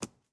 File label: arrow dirt, attack impact